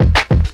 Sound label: clapback, Kick Snare; Drum loops